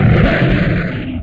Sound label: attack lion; RYL 2